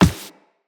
Walk Default, Footsteps; Operator

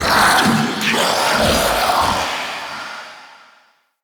Male Vocal Finisher Success, Enemy; Mockery; Mockeries

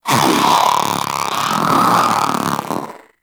Zanuka Companion Vocal Angry; Pets; Warframe

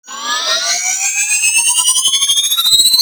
Defense Speaker Charge Up; Warframe; Nineteeen Ninety Nine

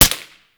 Mech Full Auto, Pistol; Grineer; Amphis; Weapons

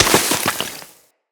Melee Impact Snow, Weapons

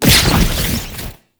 Explosive Impact; Warframe; Weapons; Akarius Prime

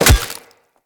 Melee Staff Impact Flesh

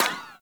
Bullet Impact Ricochet; Warframe

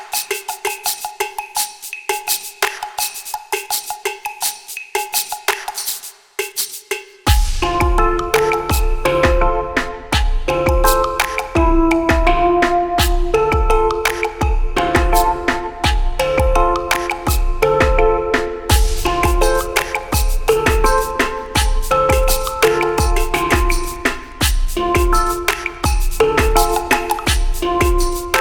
Beach Night Music; Dog Days

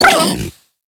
Kubrow Feral Vocal Pain; Enemies; Warframe

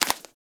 Foley Idle Ear Flap; Enemies; Warframe; Catbrow

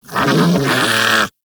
Armadillo Vocal Snarl; Warframe; Characters; Hunting Critters

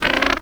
Bird Three Chuff; Warframe; Entrati

